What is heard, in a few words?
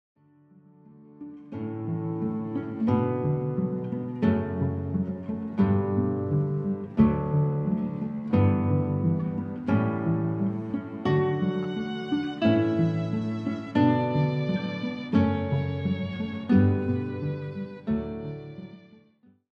Canon in D Major, guitars